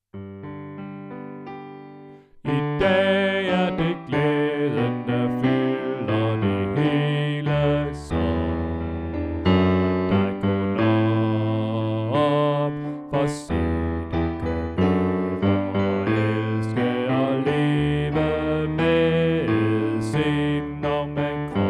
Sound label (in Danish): Bas; oevefiler